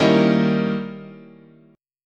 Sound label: D sharp 7th Flat 9th, chord, guitar